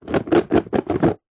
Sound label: puzzle card box shake